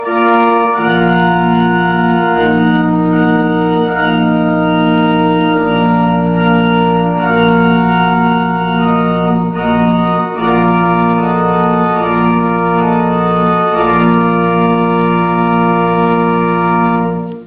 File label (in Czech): varhany